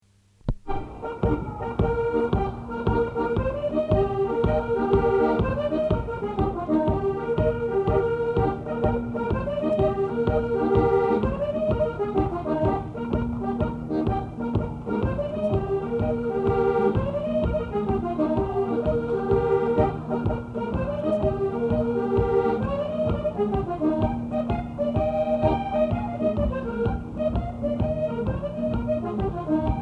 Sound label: slip jig in D or Eb